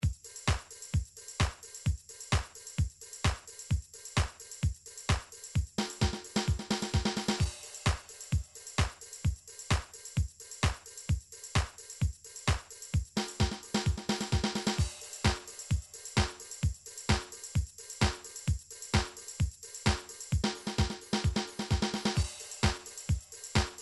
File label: drum midi techno